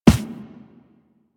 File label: Run Default, Footsteps; Warframe; Operator